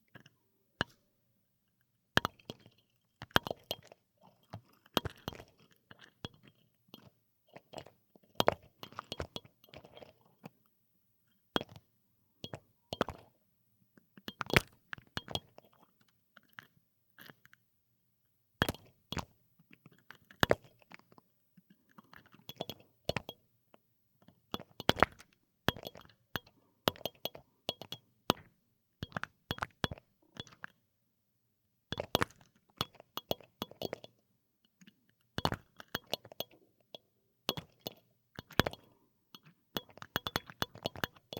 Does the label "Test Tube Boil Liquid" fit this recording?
Yes